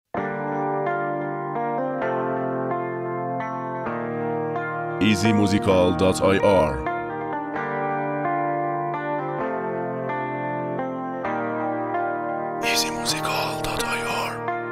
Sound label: Beat